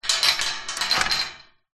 industrial gate metal locked chain push try open

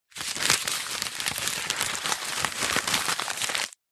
notepad ripped out pages several crumple in hand

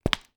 footstep single high heel; foley; zapsplat

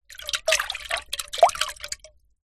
household water in metal pan shallow movements

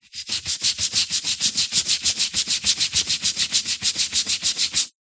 household brush scrub tile wall clean

